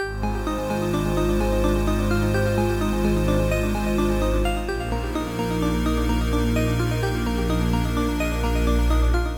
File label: ice 8bit